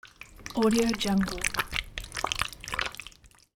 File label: slimy creature move; alexm76